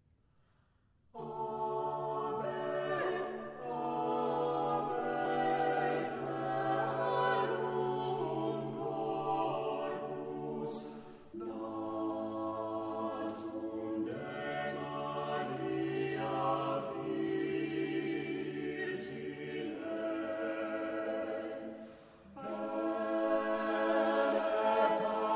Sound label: choral quartet, Vocal harmony group, a cappella; Ave Verum Corpus